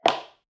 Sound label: footfall heel